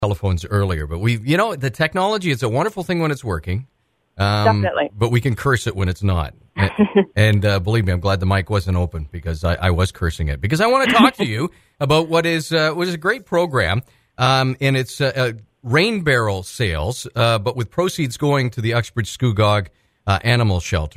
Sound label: Water Barrels; Animal Shelter